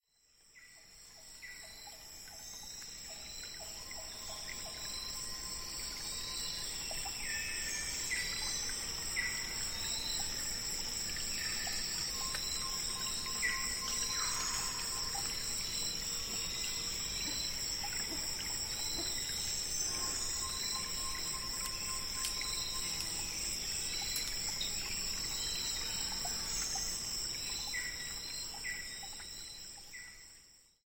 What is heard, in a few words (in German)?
Taman Negara am Morgen, regenwald; abenteuer regenwald sudostasien